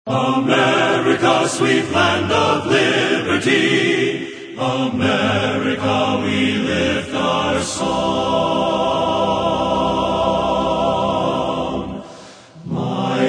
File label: Men's Chorus